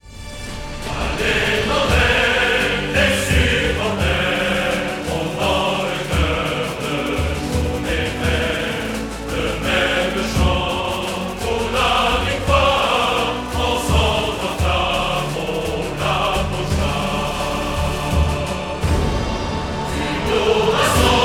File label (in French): hymne